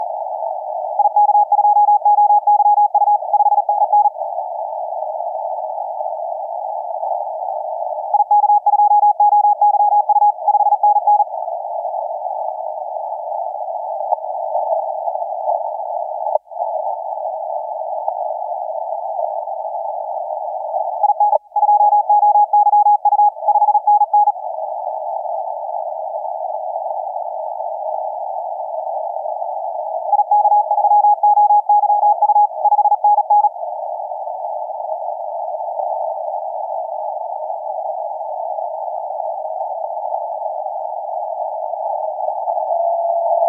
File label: 20m CW